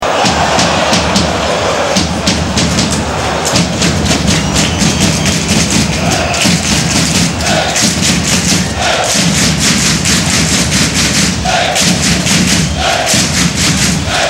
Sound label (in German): Klatschen